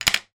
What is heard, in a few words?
nail brush small plastic down on bathroom surface